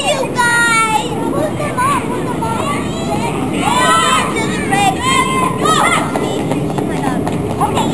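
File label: children, environment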